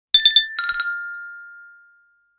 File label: Calendar alarm, Nokia alert tones; Asha 301 Southeast Asia